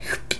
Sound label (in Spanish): Escupir